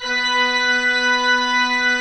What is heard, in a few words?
MANUAL B3, Pipe Organ; E-MU Producer Series Vol. 2 – More Studio Essentials; Keyboards